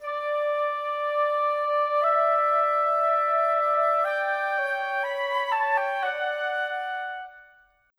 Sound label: Winds, Orchestral; Sonic Foundry (Sony Creative Software); Orchestral VOL-2; Modern 01